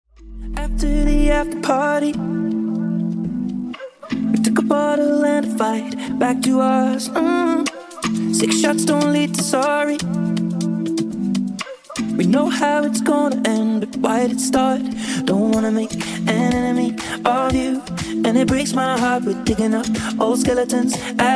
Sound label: Funk Remix 122bpm